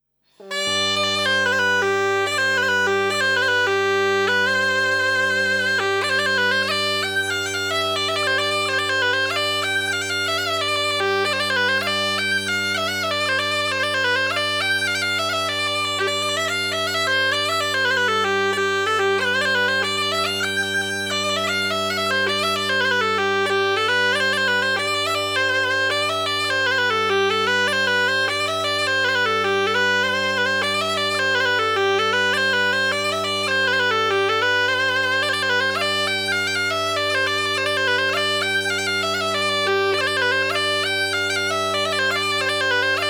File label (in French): Marche de noces; Marche de noces de Chabrier